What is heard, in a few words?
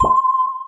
Drip; Synthetic's Serum Collection; Void Serum Bank